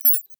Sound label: Beeps Tiny, High Tech Interface Sounds; Lesser Vibes